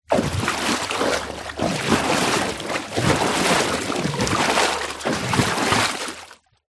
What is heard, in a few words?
nature water movement